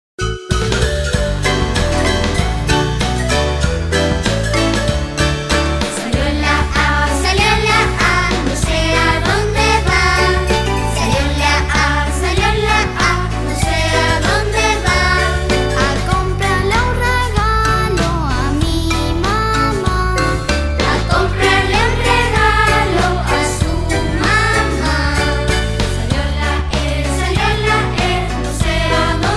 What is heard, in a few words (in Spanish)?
Cancion de las vocales; Musica infantil; materiales de apoyo a la educacion inicial en iberoamerica